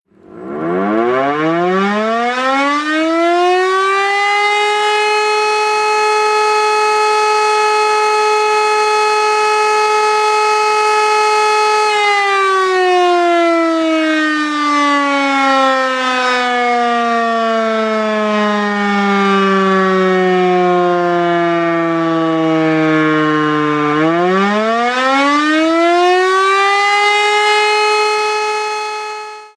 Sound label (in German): Feuerwehr Martinshorn Sirene